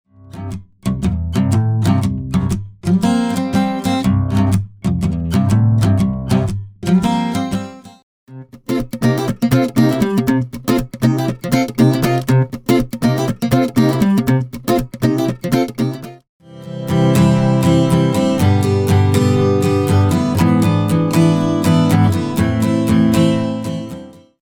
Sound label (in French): folk, guitare